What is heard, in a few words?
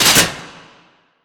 Circuit Clip; Prison Architect